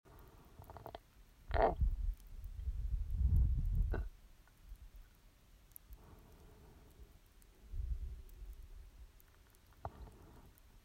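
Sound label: Gurgling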